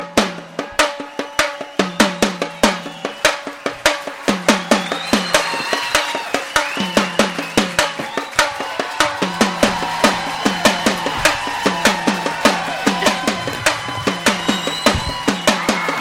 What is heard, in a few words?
Indian Street Beat